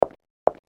walk single

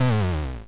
die; doublespeakgames

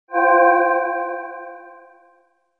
appdoor

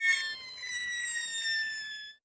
tin grab bomb drop; 2fast2curious

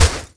Bonk; Flashpoint Ultimate 13; super-santa-kicker

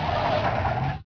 dust skid

